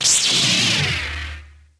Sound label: Button Press Success; interface